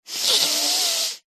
human male inhale nasal hard forced slightly blocked; zapsplat